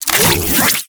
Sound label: Briefcase Katana Equip; Warframe; Corpus